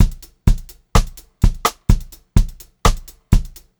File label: 126 Pop-Rock Drumloops; 126 POP 4